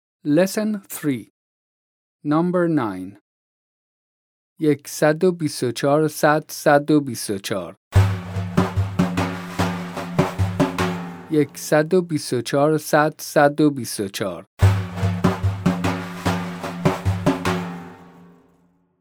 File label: vocalization and rhythm, Daf